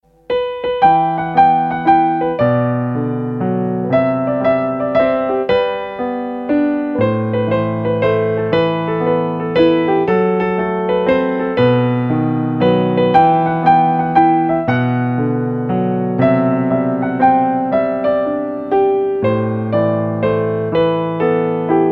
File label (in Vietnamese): Nhac Chuong Piano Khong Loi